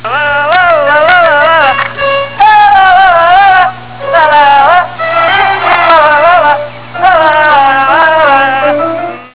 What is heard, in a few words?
lalalala